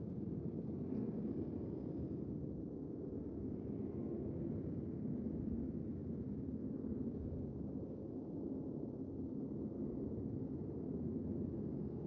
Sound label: Ambience Loop, outdoor